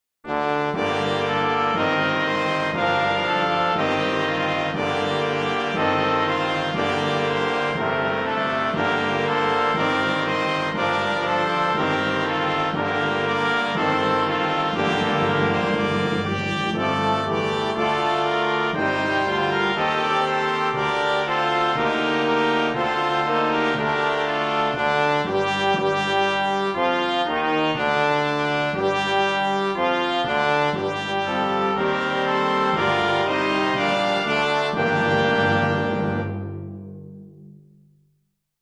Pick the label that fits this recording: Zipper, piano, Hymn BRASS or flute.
Hymn BRASS